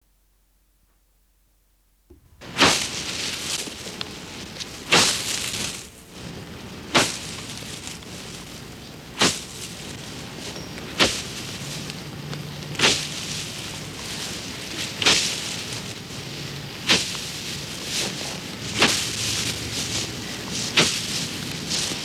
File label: Scythe winnows wheat; JERRY APPS WINTER